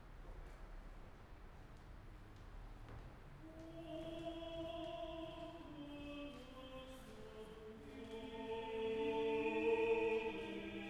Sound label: wedding music, chant